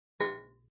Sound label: Ting, Metallic; Electronic